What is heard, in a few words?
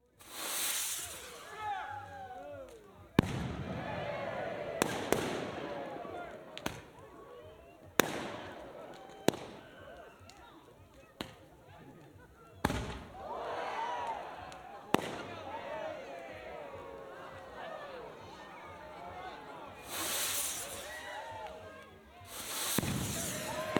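Firework Display, Exterior Atmosphere Ambience With People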